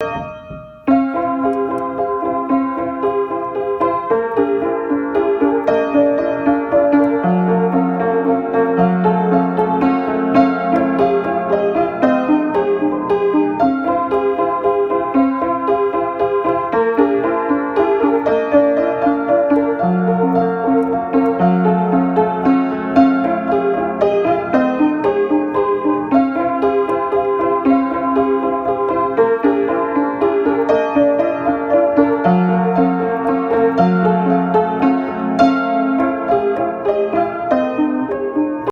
on an old, out of tune piano

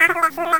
Voice Cat; Princess & Conquest v0.20.06

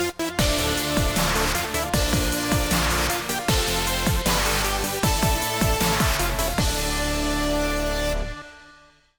Jingle; Sonic & All-Stars Racing Transformed - Original Sound Version

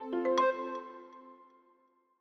Background; Longhorn Valley Sound Scheme by David Mounder

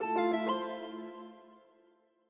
Notify Calendar; Longhorn Ten Alfa Sound Scheme by David Mounder

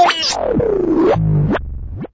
robot touch; Junkbot

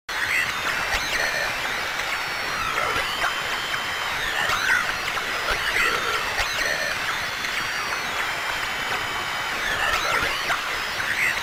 Radio Static [Danger]; Silent Hill 2

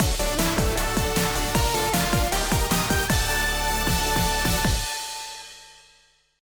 Jingle; Sonic & All-Stars Racing Transformed - Original Sound Version

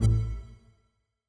Battery Low; Longhorn 7ista Sound Scheme by David Mounder

